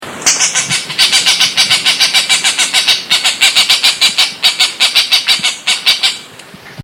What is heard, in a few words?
green jay scold